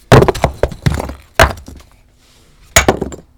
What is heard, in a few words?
Dropping Big Heavy Rocks